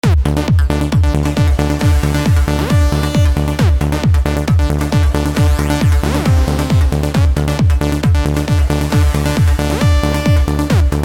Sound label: modern trance